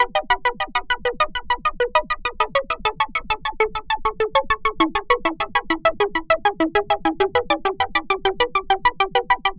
Synth Arpeggio, Synths; Eclectic Electronica

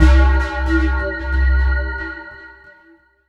C1, SynthLine; NiceBeats

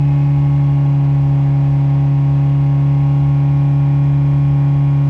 Engine Constants, Transportation

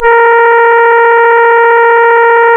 A#, Synclav FM, BRASS; Big Fish Audio

